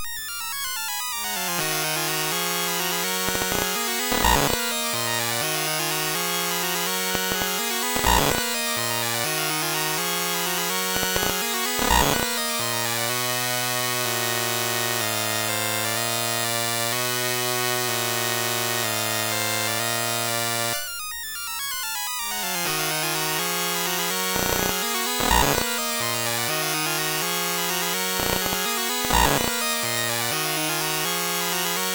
Dark Castle, MOS6581

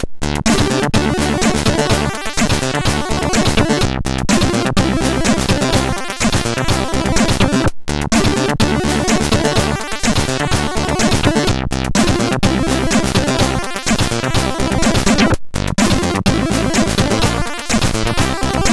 C64 remix, CSG8580R5; Rael Adventures

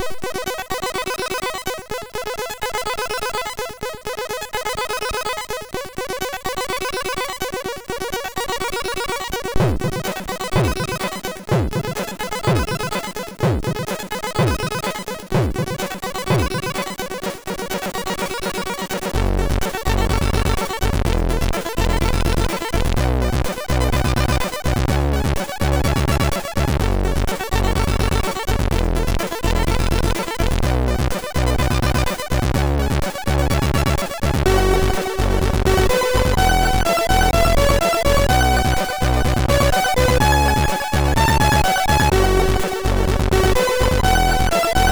Kling Klong, sid MOS6581R3

